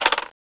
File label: Plastic Click; It's Time to Learn About Diabetes